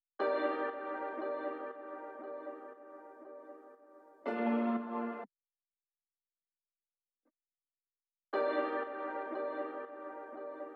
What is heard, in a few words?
118 BPM G Min Key Ambience; Melody Loops; Loop Stems; Cymatics; Pacha